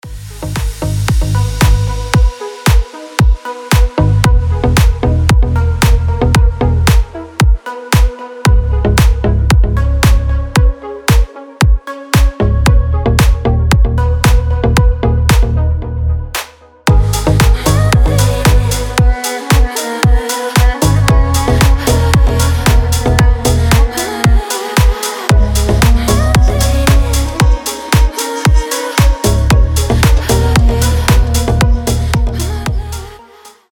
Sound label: duduk techno